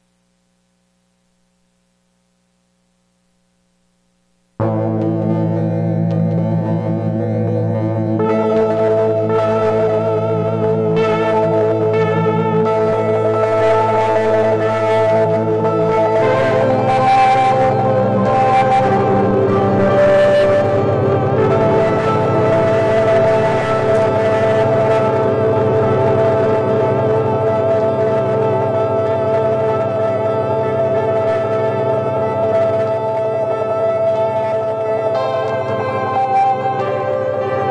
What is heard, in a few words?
Instrumental